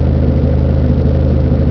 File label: CAR RUN; TRANSPOR